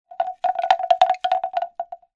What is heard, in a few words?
BELL WOOD